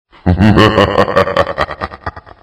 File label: laugh